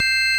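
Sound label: ORG Vox, Continental organ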